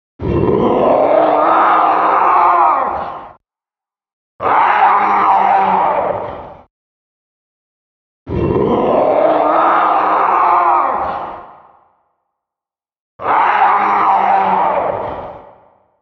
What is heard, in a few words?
16 Bit Remix Of Raging Madman Zombie Beast